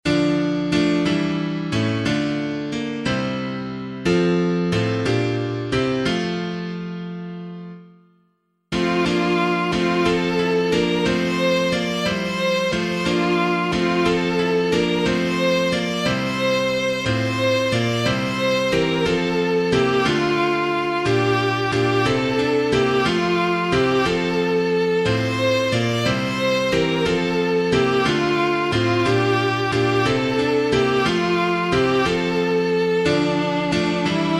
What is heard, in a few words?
piano, Hymns